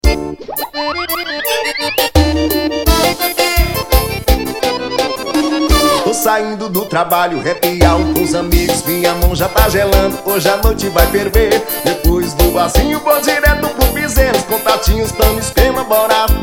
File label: piseiro